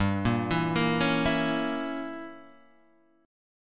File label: G Diminished 7th, Guitar, Open G